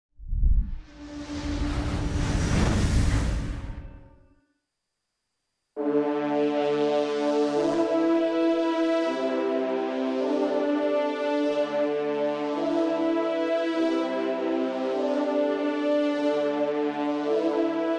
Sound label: 1980 music